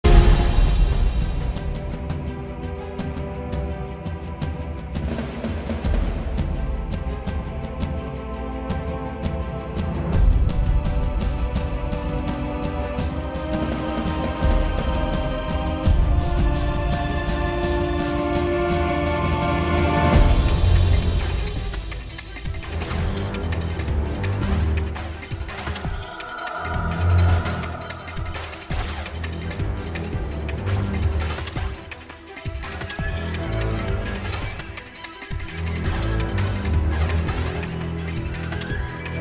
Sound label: Tension; Morbius Tension #3; Spider-Man 3